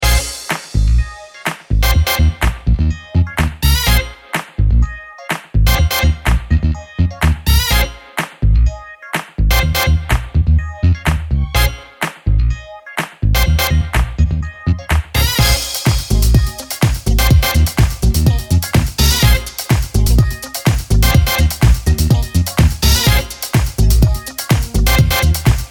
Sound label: Disco